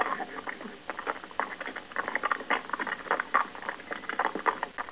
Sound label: mules